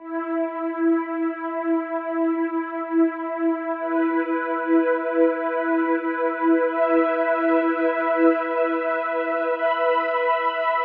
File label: 128BPM Relaxing Strings E, Melody Fills; 8Bars; Vengeance Samples